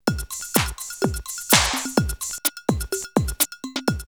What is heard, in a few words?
126, drumloops, Techno&House; Hütter; X-Static Goldmine